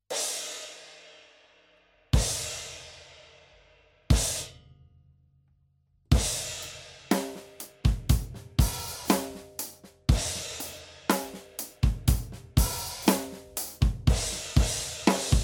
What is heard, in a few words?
Zultan Dune 16 crash, cymbals